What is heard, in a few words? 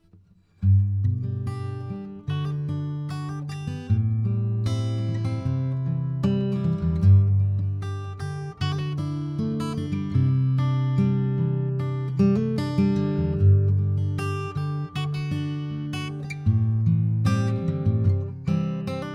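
guitar soft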